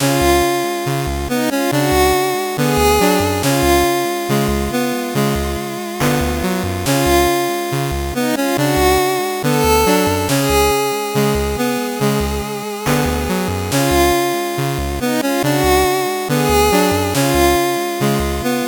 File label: 8bit bgm fast